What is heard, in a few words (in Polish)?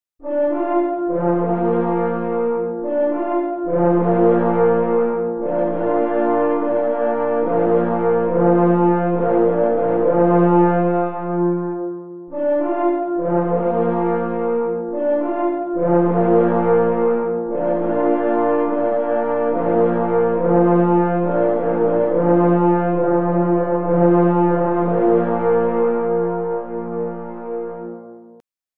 Hejnal, na 3 parforsy; Hejnal Kola Lowieckiego ZURAW w Koscierzynie